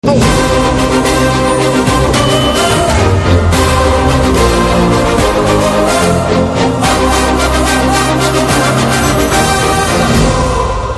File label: Trailer BGM Theme Ringtone; Vakeel Saab; Telugu